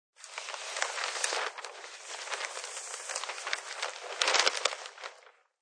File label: Nws Ppr Opn, Newspaper opened up and rustling; Crackling (Sound Effects:Objects)